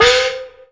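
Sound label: chinese cymbal high; AKAI S6000 CD-ROM - Volume 5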